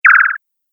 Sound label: Space Future Communication Sound, sci fi